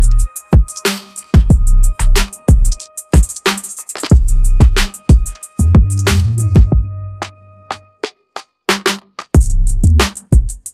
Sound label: ringtone hiphop